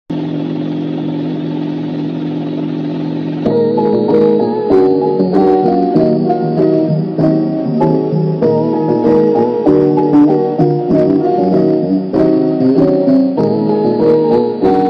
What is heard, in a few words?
Music box